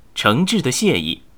被治疗, 年轻的修士; ycrpg; BattleCry

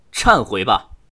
攻击, 年轻的修士; ycrpg; BattleCry

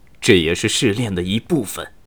受击, BattleCry, 年轻的修士; ycrpg

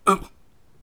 受击, BattleCry 大胡子; ycrpg

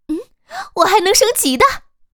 升级; BattleCry; ycrpg

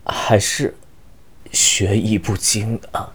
死亡; ycrpg; BattleCry; 南宫三八

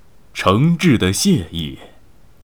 被治疗, BattleCry; ycrpg; 大胡子

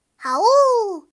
攻击; ycrpg; BattleCry; 莉莉

